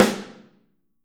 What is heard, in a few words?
Snare OH; Snare OH All; Drums Percussion